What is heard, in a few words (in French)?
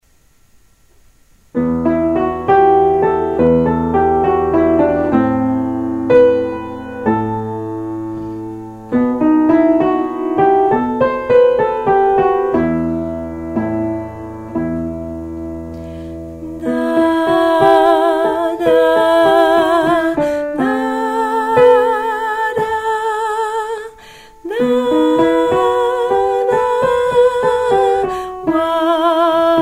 2 voix